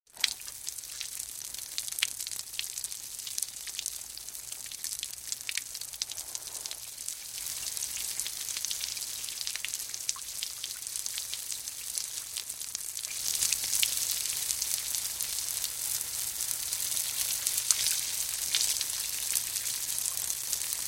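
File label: water sounds; zvuki vody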